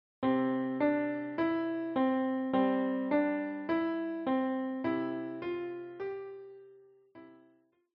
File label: Frère Jacques Kl